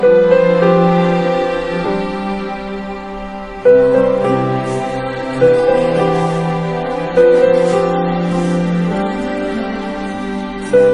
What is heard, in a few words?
Instrumental Mix